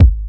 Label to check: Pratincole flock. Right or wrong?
Wrong